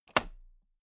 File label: toolbox latch open loose